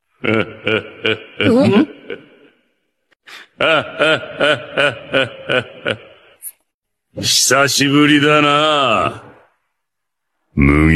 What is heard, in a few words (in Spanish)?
crocodile risa